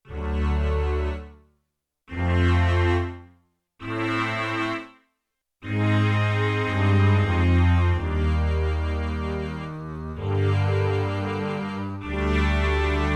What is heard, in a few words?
Chorus, Bass & Cello, Yamaha TX16W